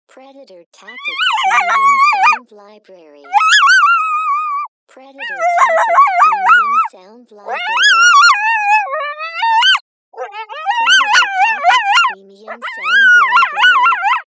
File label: Territorial Female